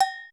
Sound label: AGOGO, SY99; Crypton - DrumBase5000